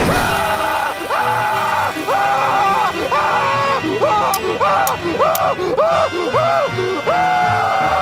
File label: screaming; mr krabs; krab borg